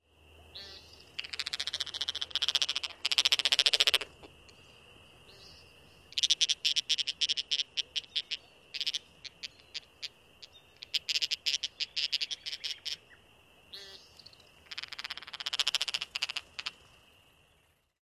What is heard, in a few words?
aggressive cackles